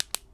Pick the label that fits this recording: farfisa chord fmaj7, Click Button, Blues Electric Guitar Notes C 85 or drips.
Click Button